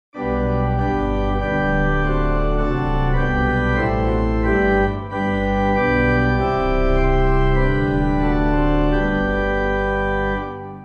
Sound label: organ, Hymns